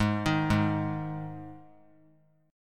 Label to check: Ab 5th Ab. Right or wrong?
Right